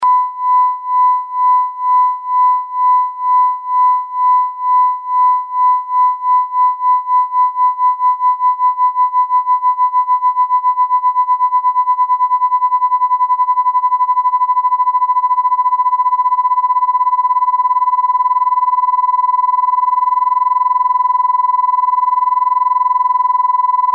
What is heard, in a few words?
Amplitude Modulation Varying